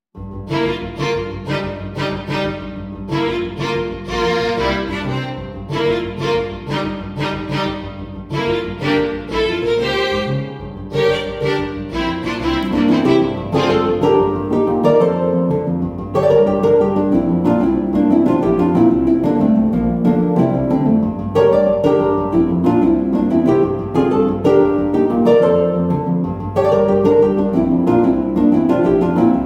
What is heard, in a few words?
Violin; Undertale